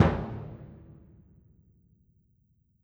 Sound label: Bass Drum, Orchestral; Sonic Foundry (Sony Creative Software); Orchestral VOL-2; Modern 11